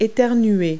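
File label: sneeze; Multi-Lingual Talking Picture Dictionary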